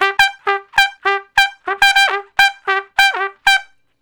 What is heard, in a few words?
Trumpet Straight (G); Solos